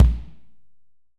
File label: AMB KICK; ST AMB KICK